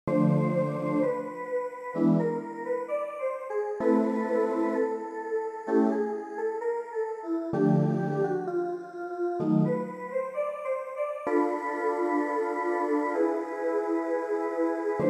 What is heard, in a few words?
tango